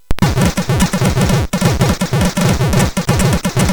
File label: sid MOS6581R4, GAMES; Dark Caves